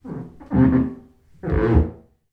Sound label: household body movement in metal bathtub with squeak